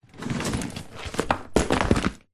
foley luggage suitcase leather slide then set down hard concrete